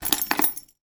household door keys hang on hook; sound effects one

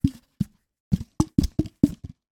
sport tennis balls x3 put into tube; sound effects one

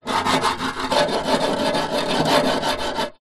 metal rod scrapes fast on rusty metal table

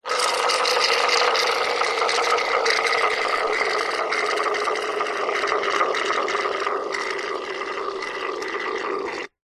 household shaving foam spray into tube

